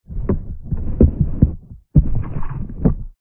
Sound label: manipulate underwater or space handle; lukas tvrdon